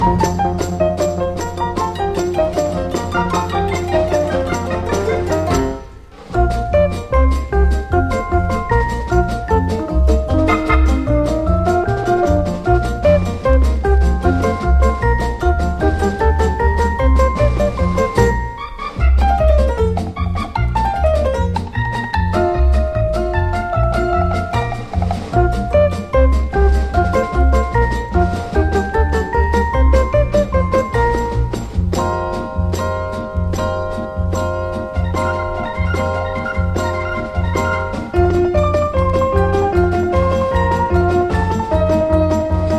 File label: CARNIVAL IN PERCUSSION